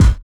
Kick, Kickdrum; Equipped Music; Loungin House

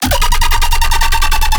bass loop 150; cyberdolphin; Virtual Riot

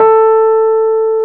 PNO, Electrics, Rhodes

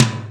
R8 Tom, Roland R-8

